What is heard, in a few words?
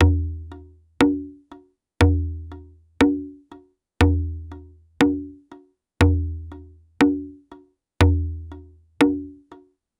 rhythmic foundation, cultural